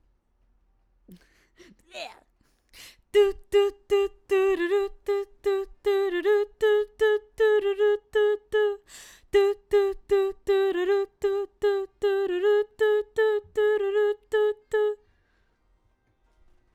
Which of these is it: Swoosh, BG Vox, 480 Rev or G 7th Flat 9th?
BG Vox